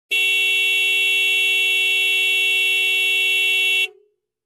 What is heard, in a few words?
Car horn long